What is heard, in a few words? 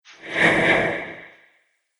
Swoosh Whoosh; Whoosh Sound Effects; Swish, Zoom, Swipe, Whoosh, Swoop, Transition; Andrew Fleming